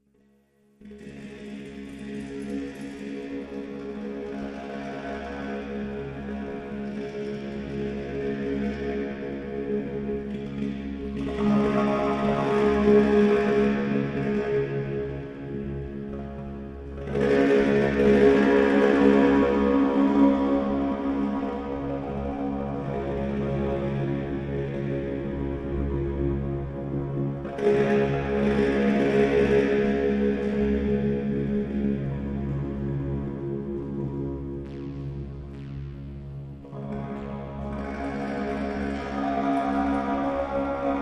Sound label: Vocoder SFX Only Mix